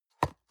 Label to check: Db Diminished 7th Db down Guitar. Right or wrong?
Wrong